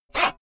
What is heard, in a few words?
saw use short quick